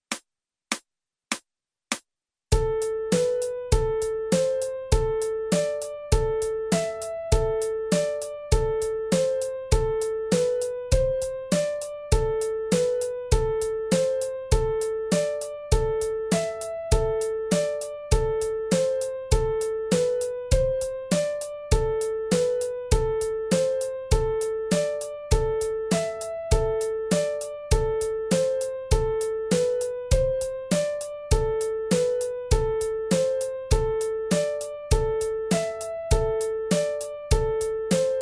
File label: Piano